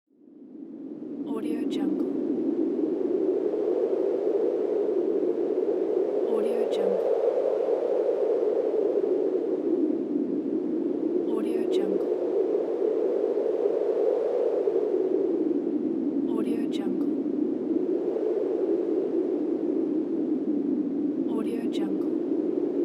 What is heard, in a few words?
winter wind